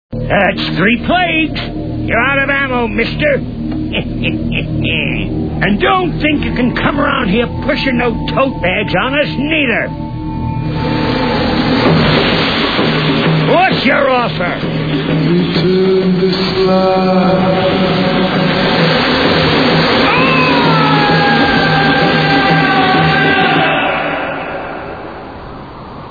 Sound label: ammo